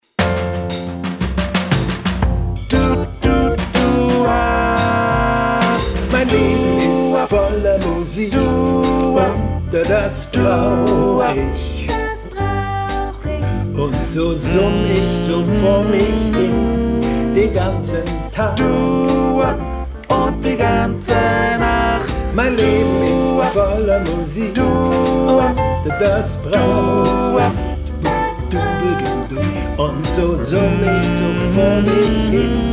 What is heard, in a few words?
Tenor